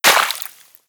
debris water, bullet impact debris

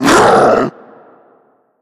alert, zombie vox; creatures

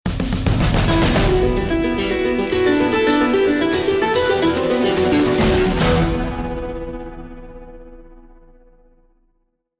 won round

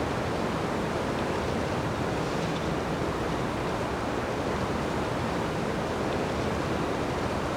lightwind; ambient; Counter Strike Model Pack; CS:GO

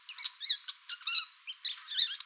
rats; ambient; creatures

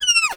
scared whimper; creatures; headcrab classic; hideout

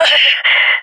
death; fbihrt epic

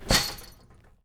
footsteps metal chainlink; player

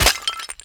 tile impact bullet; physics; surfaces

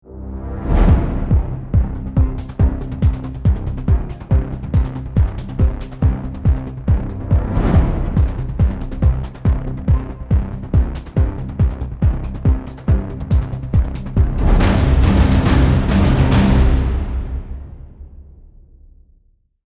start action, music

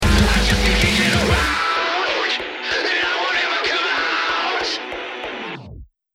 lost round, music

